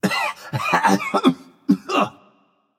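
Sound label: nonverbal clear throat; smite; voicepack; merlin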